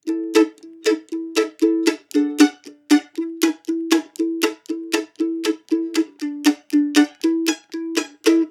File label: boom chuck, mandochords